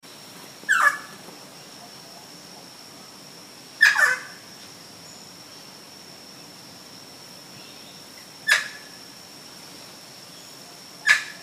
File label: squawk